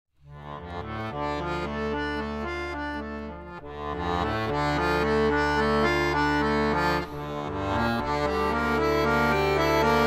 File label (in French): Fantaisie ré mineur